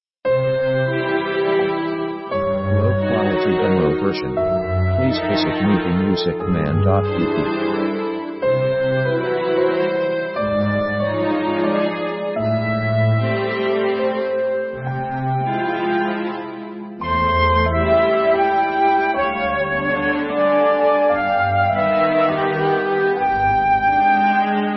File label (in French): Valse numéro, slow